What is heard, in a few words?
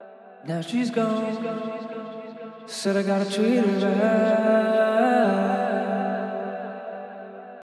vocal before